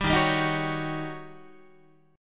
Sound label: Eb 7th Sharp 9th, Eb, Guitar; Eb7#9 strummed; 10,11,10,13,10,12; JGuitar